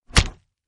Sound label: fall; free rider hd